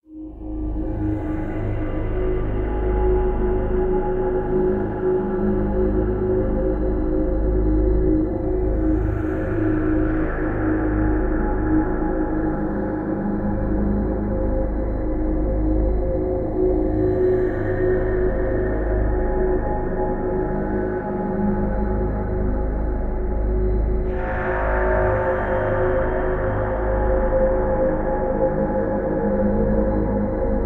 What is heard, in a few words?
Ambient Scene; Tarr Chronicles Sign of Ghosts